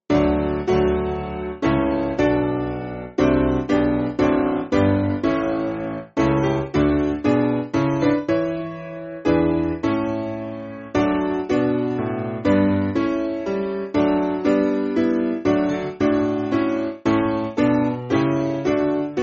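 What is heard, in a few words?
Piano, Music Backing Files for Churches; From Thee All Skill; Belgrave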